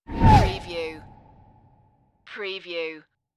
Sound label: Wipe